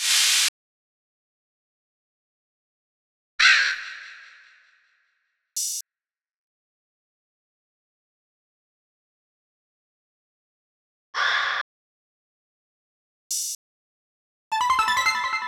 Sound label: 124 BPM E Min FX; Melody Loops; Loop Stems; Cymatics; Anubis